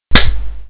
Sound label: slap